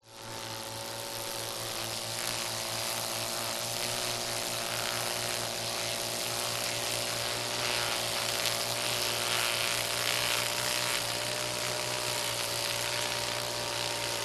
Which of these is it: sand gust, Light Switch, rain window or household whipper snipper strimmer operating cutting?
household whipper snipper strimmer operating cutting